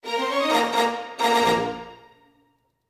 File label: Stinger Win Game; across the obelisk